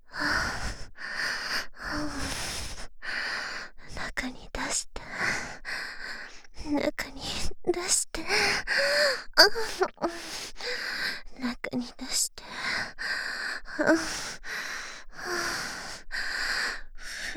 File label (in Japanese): 中に出して 喘ぎ; ハーレムオナサポ 4人のメイドがご主人様のオナニーをお手伝いするバイノーラル～文、千曜、名月、莉桜～